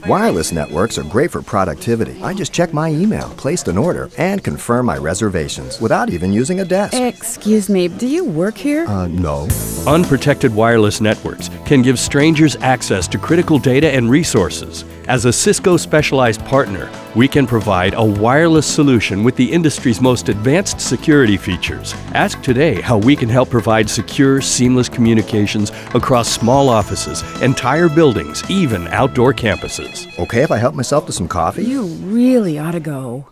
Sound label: on hold; wireless